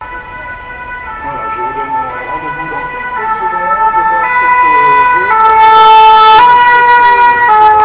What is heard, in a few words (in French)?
pompiers sirene